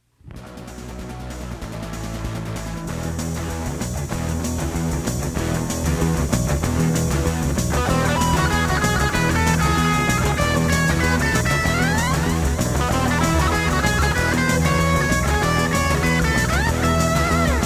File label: Whole Tone Shuffle, guitar